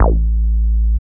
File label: DR. LOOP, BASS; X-Static Goldmine 3